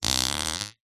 human fart squeezed forced; zapsplat

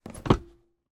car arm rest up

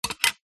foley glass sheet small square set down on tile; zapsplat

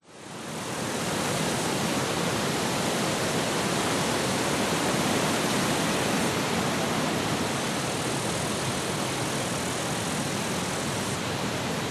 wind trees forest light insects summer australia; nature; zapsplat

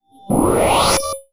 Stun Gun; martyn stonehouse

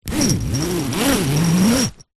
sleeping bag zipper undo slow gentle; zapsplat; foley

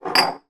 foley beer bottles slide into each other

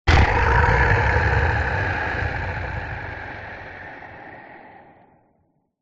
horror monster grown sudden; zapsplat

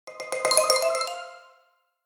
Computer alerts; ComputerSFX; soundbits

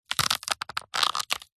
horror bone break snap twist or crush; zapsplat

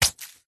foley wet cloth dab squelch soft single; zapsplat

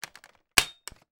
household vacuum bagless cylinder remove; sound effects one

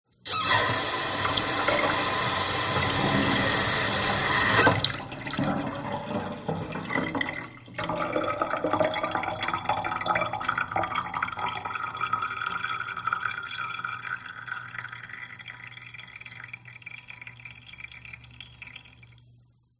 tap water; fox audio

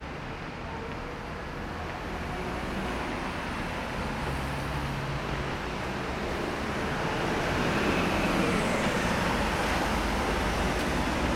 ambience urban city london night; sound effects one

